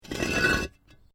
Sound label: Brick Medium Hollow Move